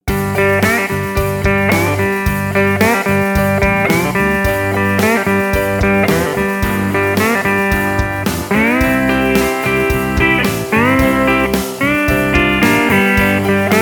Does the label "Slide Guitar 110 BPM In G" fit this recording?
Yes